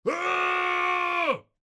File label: scream; Highland Reels